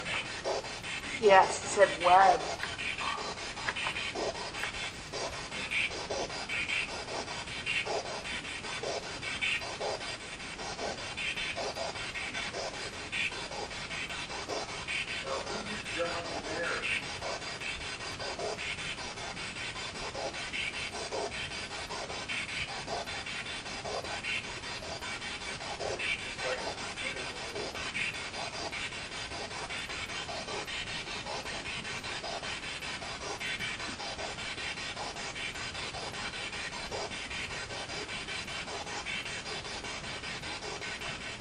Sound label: Balcony Spirit Box; twin city opera house investigation